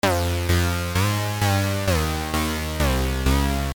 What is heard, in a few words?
Bass Hard